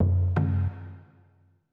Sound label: PERCLOOP ETHNO 125